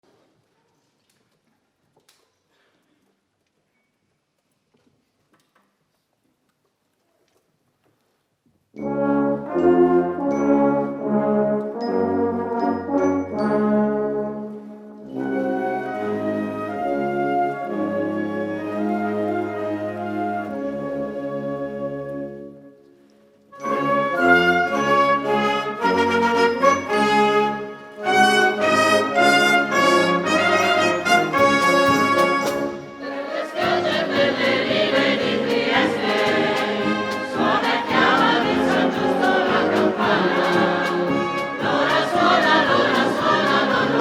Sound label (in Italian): LA CAMPANA DI SAN GIUSTO Filarmonica; Capezzano Monte (LU)